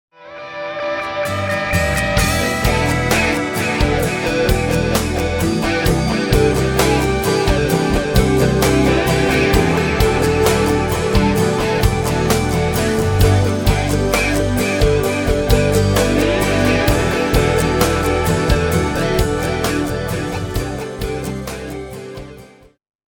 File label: Falling texture